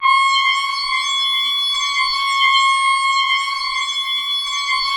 SCREAM2 G5, Yamaha VP-1, SCREAMSYNTH; SCREAMSYNTH2; Music Store

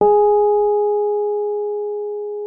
Rhodes MK1 G#4; Xsample VOL-1

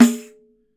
PANDEIRO, Brazil Drum Perc; E-MU Producer Series Vol. 7 – Old World Instruments

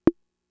Conga, Fricke MFB-522

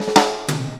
FILL SD, Ragga & Dub Reggae; USB Soundscan vol.27; FILLACOUS

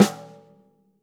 snare snares on; JazzFunkKit

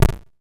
Elektron Monomachine, Drum Machines

